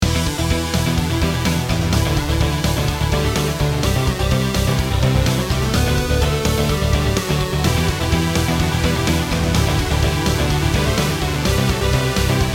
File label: Stage Bonus; BullShot